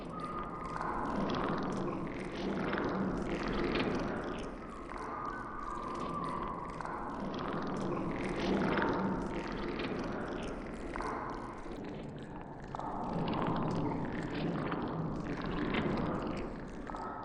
Calm Behind Door; Lethal Company

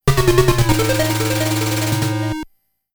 Miss

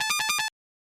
Monsters' Horn

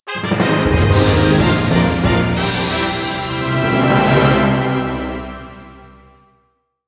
Weapon Unlock; Soulcalibur Legends; Wii

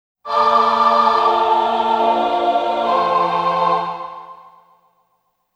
Baton; Legend of Zelda: The Wind Waker; GameCube

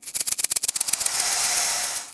Snake

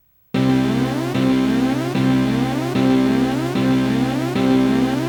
Warning; Armed 7 DX

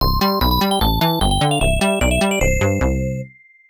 Round Clear; The NewZealand Story; arcade

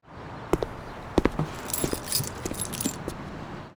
Takes Key; Pauline; yesterday origins gamerip 2016 switch ps4 pc ios macos

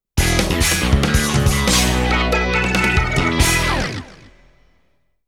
Victory Funk Victory Jingle; tokimeki memorial taisen tokkaedama poitter s point

